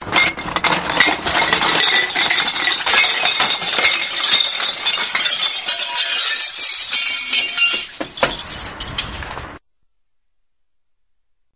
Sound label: Glass Recycle